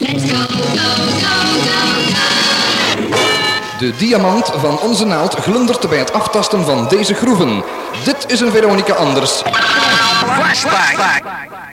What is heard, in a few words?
Flashback fast; Allerhande